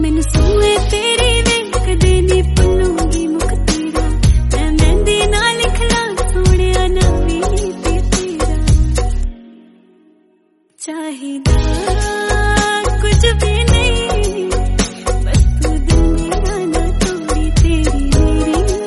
Cover Version Punjabi Song

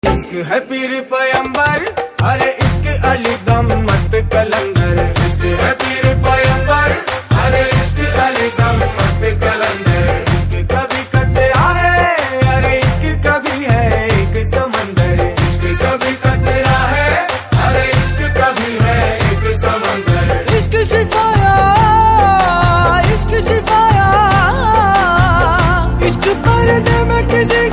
Hindi Ringtone

